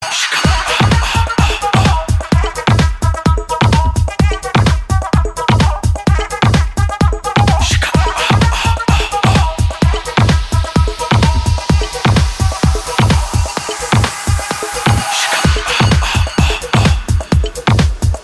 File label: latin ringtone